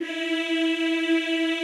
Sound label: EEH E3, Extended Classical Choir; Best Service